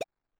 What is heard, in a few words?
collect; grunk; New grunk collection SFX